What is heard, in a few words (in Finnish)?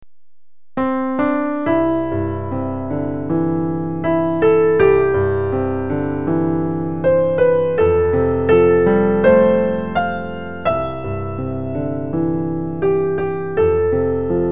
Pianoballadi